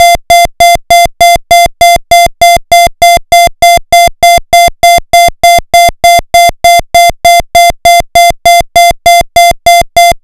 Interrupted Sweden, Sweden SS031711 (emergency signal); Interrupted tone; pfannenberg; signaling-technology; combined-signaling